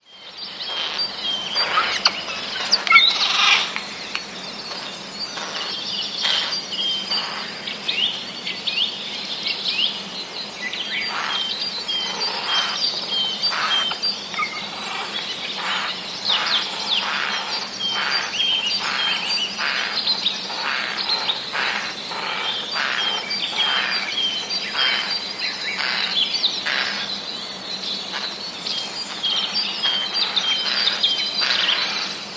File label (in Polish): sojki; sójki po prostu "skrzypią"